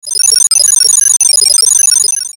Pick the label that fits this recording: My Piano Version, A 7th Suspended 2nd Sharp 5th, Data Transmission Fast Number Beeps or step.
Data Transmission Fast Number Beeps